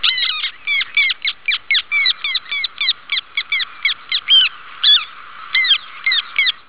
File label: chlidonias niger